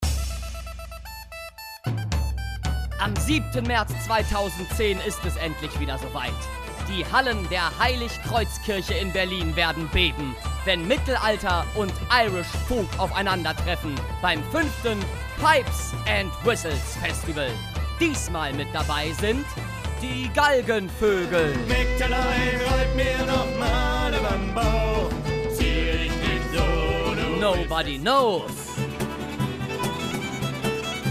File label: pipes and whistles jingle; festival berlin